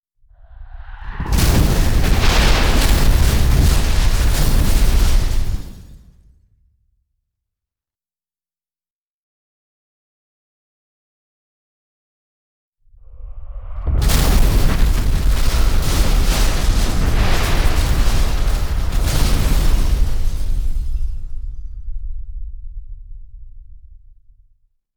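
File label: fall of a meteor asteroid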